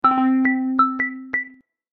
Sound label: ringtone